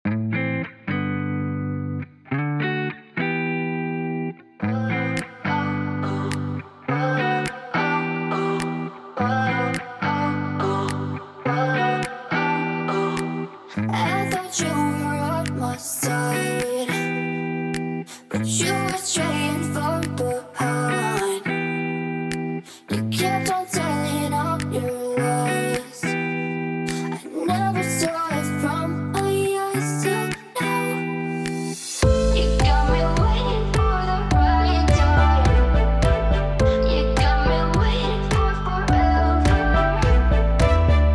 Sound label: Pop Vibes